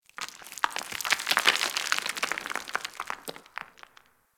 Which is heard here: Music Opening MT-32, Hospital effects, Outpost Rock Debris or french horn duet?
Outpost Rock Debris